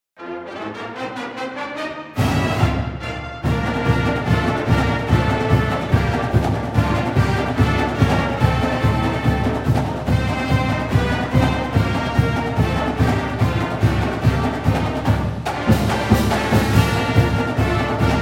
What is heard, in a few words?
Ringtone; Hail Indiana; Andriod